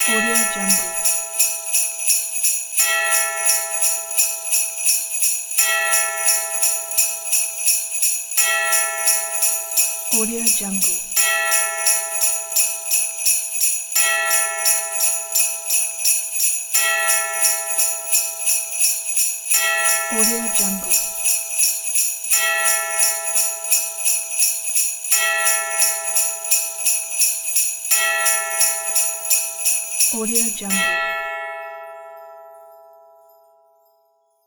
new year bells

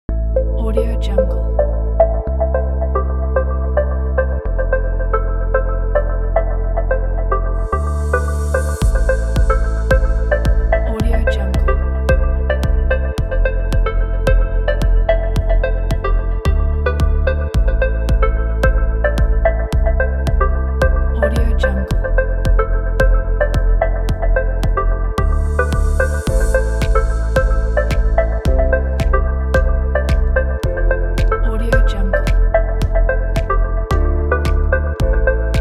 technology ambient

